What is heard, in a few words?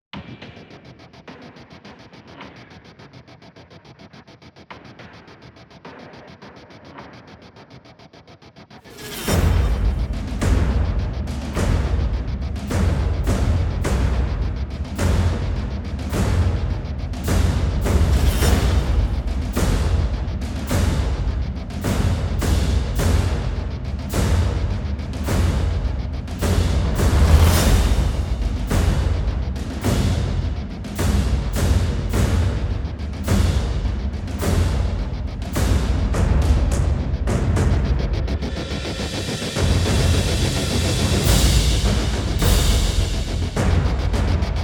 Perc and Pulses; Brand X Music